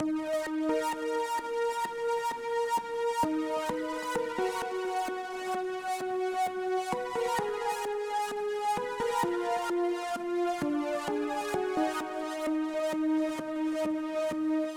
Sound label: Passed Melody; madness project nexus